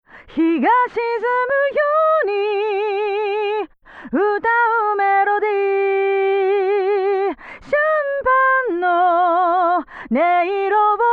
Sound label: Lo fi Processor SR 16kHz